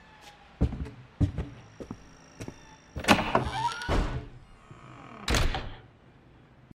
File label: Door